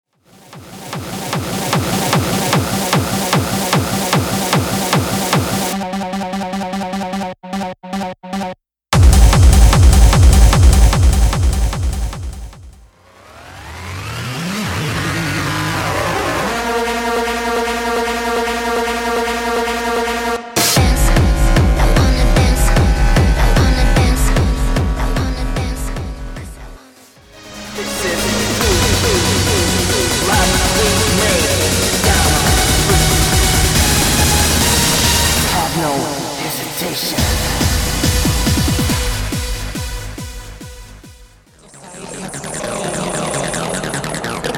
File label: HARD TECHNO